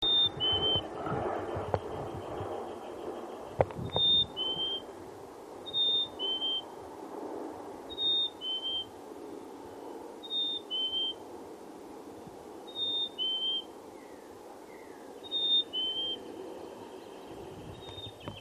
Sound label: chickadee